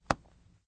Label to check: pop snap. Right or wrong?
Right